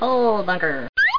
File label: COAL BUNKER whistle